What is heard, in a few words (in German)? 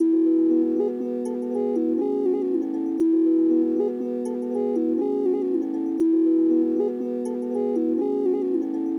Boden